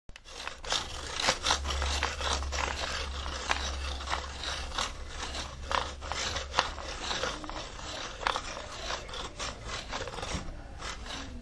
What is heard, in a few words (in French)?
taille crayon